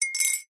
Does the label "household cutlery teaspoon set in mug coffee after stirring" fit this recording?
Yes